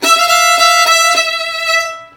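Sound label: VIOLIN LOOP, Asian Traditions; USB Soundscan vol.60; AKAI; VIOLIN LP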